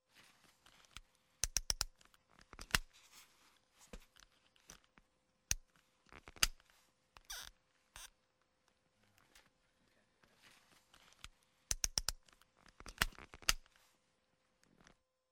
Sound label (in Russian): zastezhka konkov; Звуки катания на коньках